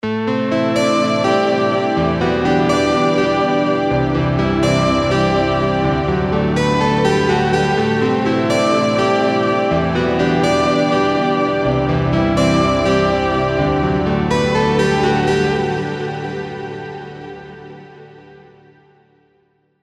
Luminous Echo Path, keys; revealed diva keys vol 2

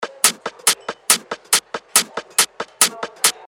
techno loop 140 BPM; Paris; revealed techno top loops vol 8

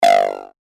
Acid Shot G; synth shots tech house; Tarantula; revealed

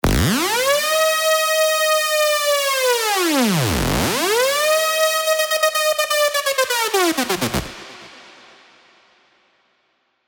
FX Ambulance; revealed serum 2 bass tech house vol 1

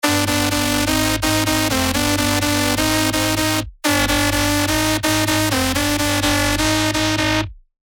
LD, serum 2 bass tech house; revealed serum 2 bass tech house vol 1

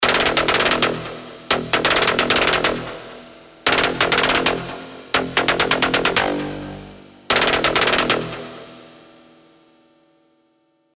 Stab Tower Breaker; alonso 18 year anniversary pack

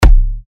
15 C#, techno kicks; Sailor; Revealed Techno Kicks Vol. 10

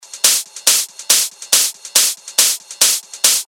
140 BPM, techno loops; Unhinged; Revealed Techno Top Loops Vol. 9

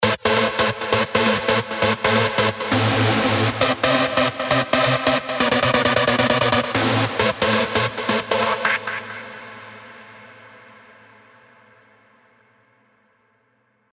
Stab; Stab Ignition; alonso 18 year anniversary pack; Spire

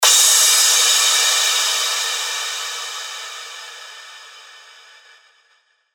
Crash, cymbals; revealed cymbals vol 1

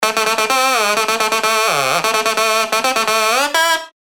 dirty dutch leads; Wackman; revealed spire dirty dutch leads vol 1

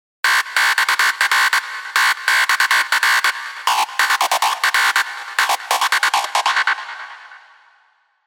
Screech; Screech ieDream; Revealed Serum 2 Big Room Techno Drops Vol. 4

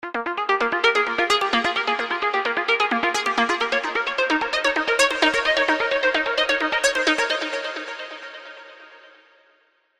Rolling Deep, trance plucks; alonso spire trance plucks vol 3

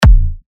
TECHKICKS A, techno kicks; Config; Revealed Techno Kicks Vol. 10

